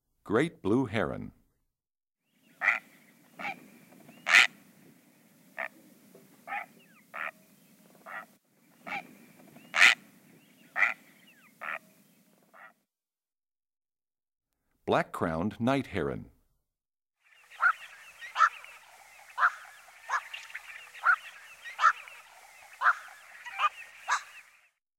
Great Blue Heron, Black-crowned Night-Heron, Yellow-crowned Night-Heron, Green Heron; Birds; Eastern-Central; Least Bittern